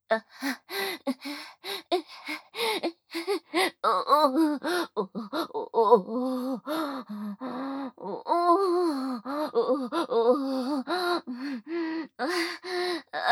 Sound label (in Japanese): 騎乗位・喘ぎ声・普通